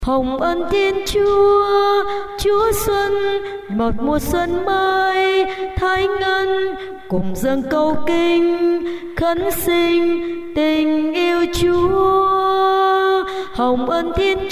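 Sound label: soprano alto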